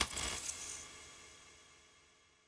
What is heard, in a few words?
Music; Sifu